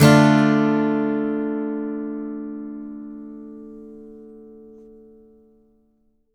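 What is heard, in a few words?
Acoustic guitar D minor down, Country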